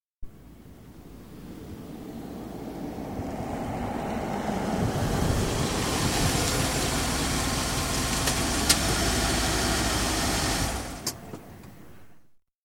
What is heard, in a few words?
Rolls Royce Silver Spirit, exterior, approach & stop; 6.75 litres, 1986 model; Car; BBC